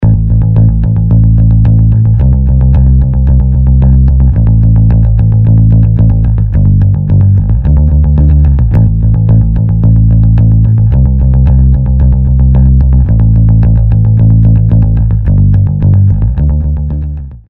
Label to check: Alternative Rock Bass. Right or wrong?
Right